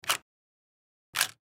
Camera Shutter, Camera Shutter Professional Digital Snap Extremely Slow; Picture Movement; Technology; Blastwave